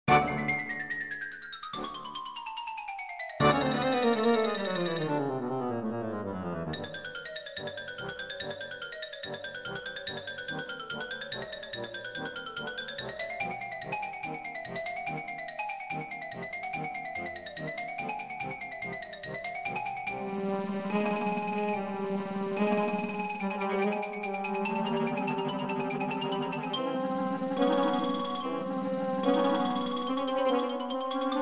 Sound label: Xylophone; Flight of The Bumble Bee